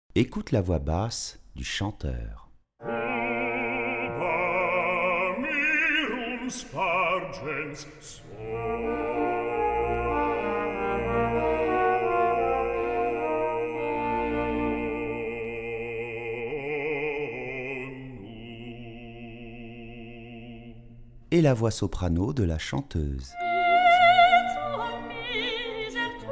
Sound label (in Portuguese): Voz baixo vs soprano